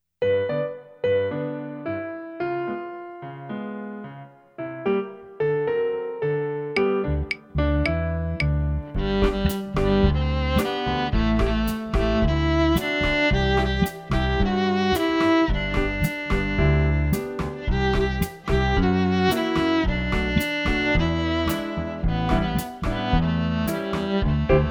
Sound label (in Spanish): Rumba rumbita